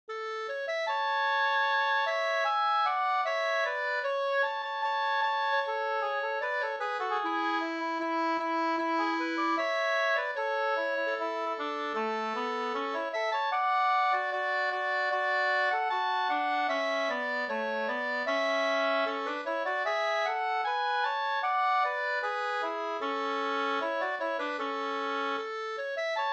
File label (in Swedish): brudmarsch; fr Markaryd; e Bernhard Ljunggren